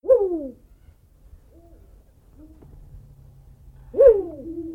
Uhuweibchen

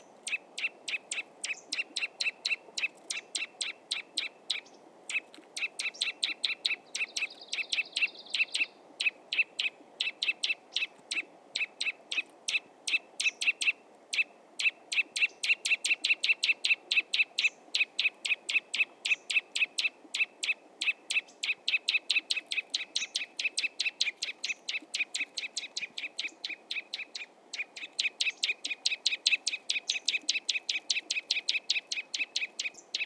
Kleiber Alarmruf

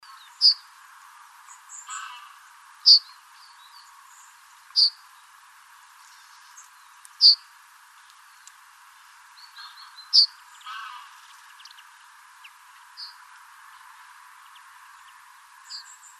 Goldammer Ruf

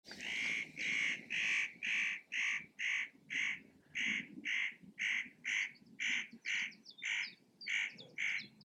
Tannenhäher Ruf

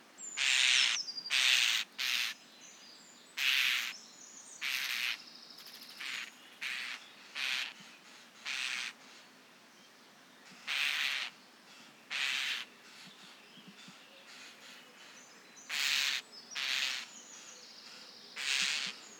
Star Alarmruf

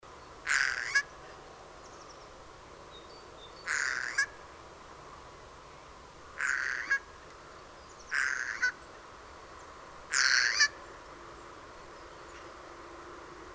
Eichelhäher Gesang

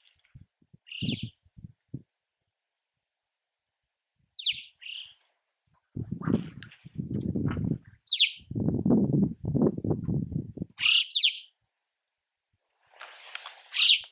Alpendohle allgemein

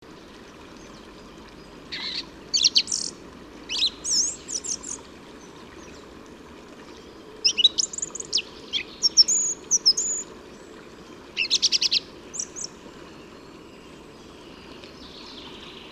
Singdrossel Gesang

